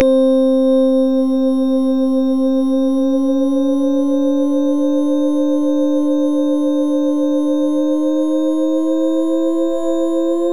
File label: Musical Scales